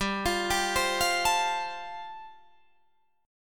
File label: G 9th Suspended 4th, Guitar Drop D